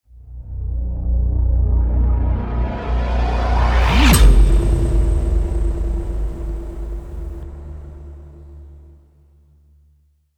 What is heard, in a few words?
Riser